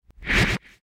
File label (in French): coup de poing